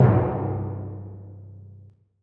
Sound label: timpani f lh c1; Percussion; Sonatina Symphonic Orchestra